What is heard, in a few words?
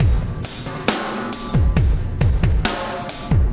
Landing Party Beat, Processed Drumkits; Sonic Foundry (Sony Creative Software); Processed Drumkits Zero Gravity Beats